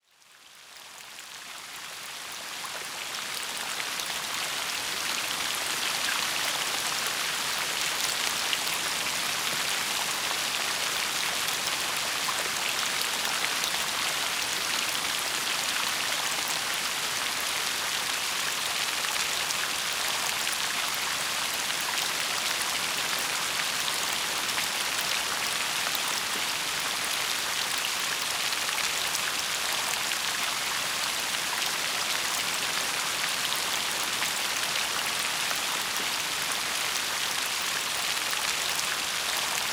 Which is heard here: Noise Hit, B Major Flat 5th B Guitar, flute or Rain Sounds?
Rain Sounds